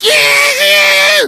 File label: become enraged, infected